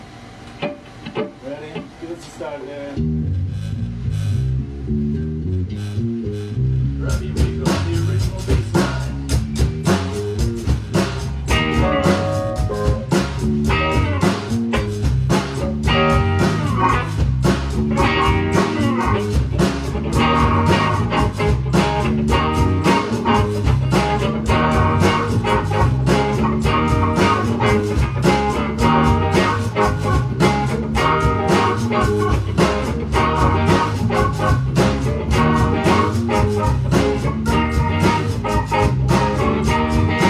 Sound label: funky song